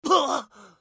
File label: scav wound; eft